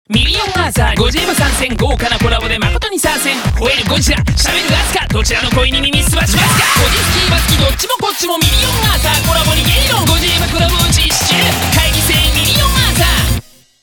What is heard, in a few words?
SQUARE; GATSBY 青春は汗とボディペーパー